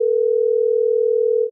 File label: phone; outfly